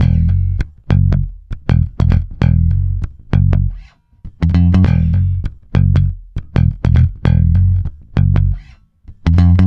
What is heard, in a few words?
F#, mute, bass; Spectrasonics; Bass Legends; DEF MUTE